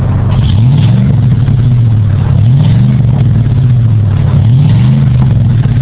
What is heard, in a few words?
chevy 2500 hd 8.1l dual sport; Chevy/GMC